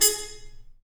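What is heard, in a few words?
brass trumpet mute stab, Harmon stabs; Harmon Amb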